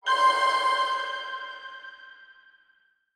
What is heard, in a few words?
Title Sting; escape academy